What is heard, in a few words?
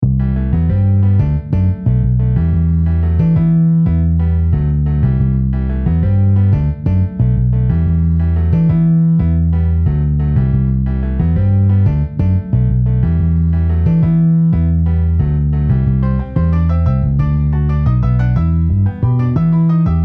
Piano Bass MIDI